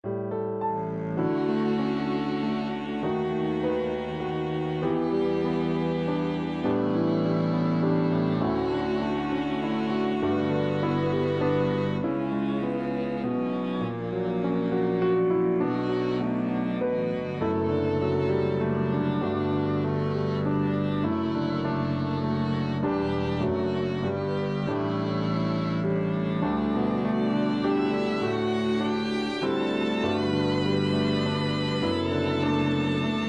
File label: background